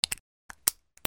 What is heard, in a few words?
CLICKS